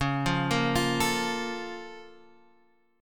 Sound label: A sharp Minor Major 7th